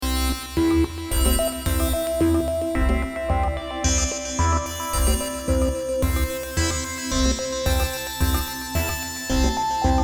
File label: Dogs Broken Windows